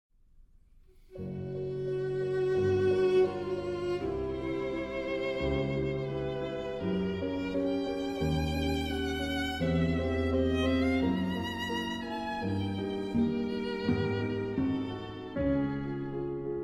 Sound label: Piano Trio